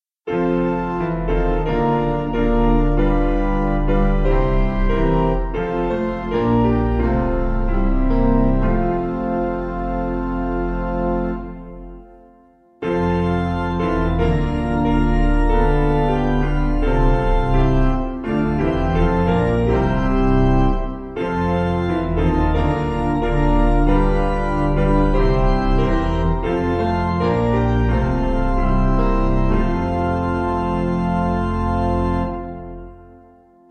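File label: Organ Piano; Gyda Thoriad; Salm Foreol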